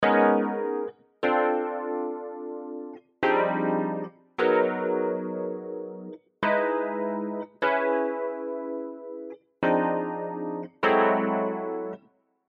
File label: VERSE REHARM